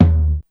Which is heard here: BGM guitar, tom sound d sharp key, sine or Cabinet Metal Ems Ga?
tom sound d sharp key